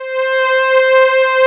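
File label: analog strings C4, analog synth; AKAI S6000 CD-ROM - Volume 1; SAMPLE WAVE MIXING 1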